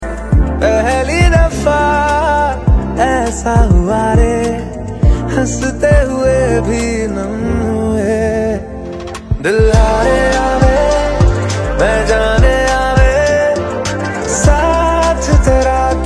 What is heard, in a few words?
Ringtone